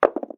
Throwing Dice; Realme 8

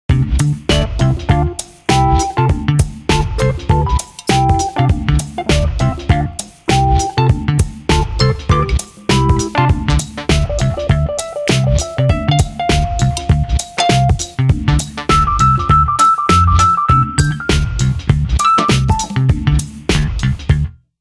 Nokia Tones; Windows Phone apps

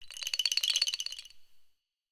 Rattle; Galaxy

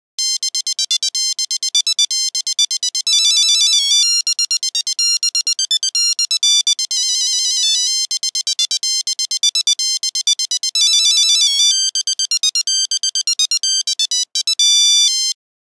Nokia Buzzer Toreador; Windows Phone apps

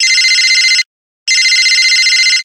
Electri Call, phonetones BlackBerry

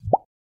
Email Sent, LG Optimus G Pro; LG Optimus G Pro F240K Lollipop

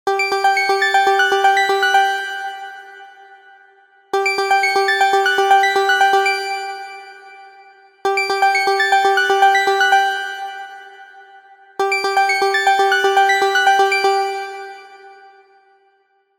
Ripple; Tinno ATT Calypso 3

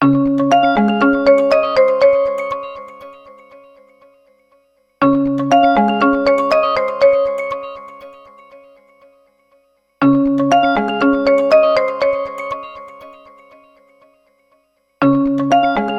Marimba; ZTE; Axon-M

